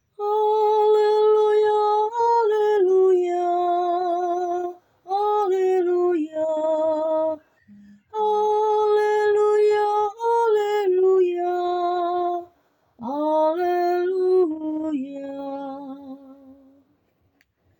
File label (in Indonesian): aleluia; mazmur misa minggu biasa xxii 1 september 2024